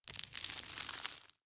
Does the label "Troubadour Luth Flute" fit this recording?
No